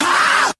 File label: "ah"